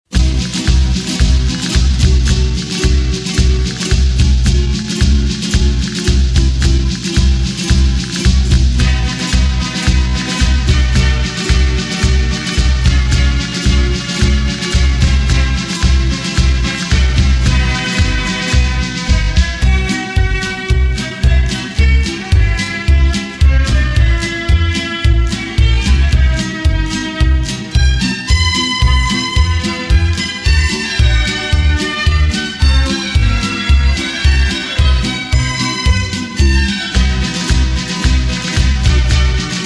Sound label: Gypsy Flamenco, Paso Doble